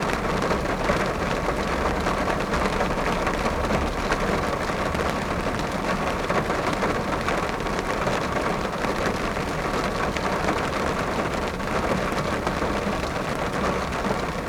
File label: Field Recordings Oregon Rain Medium Rain In A Car; Omni Gardens